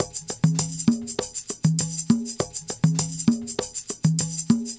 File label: Percussion, R&B Drums; Sonic Foundry (Sony Creative Software)